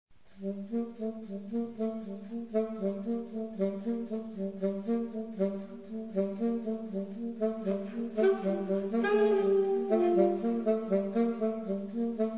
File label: violin